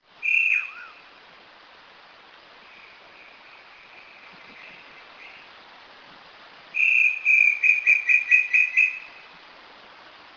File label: Crypturellus obsoletus Inambuguaçu